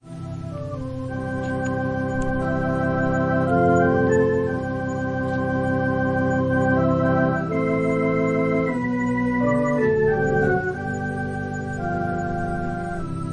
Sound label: Organ prelude